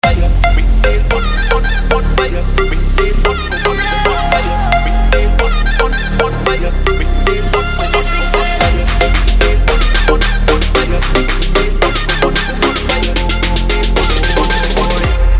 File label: Music Ringtone